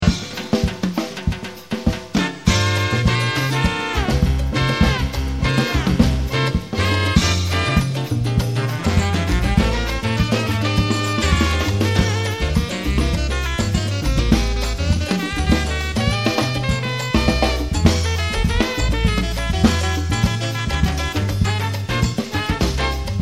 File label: LATIN JAZZ; claudiodrum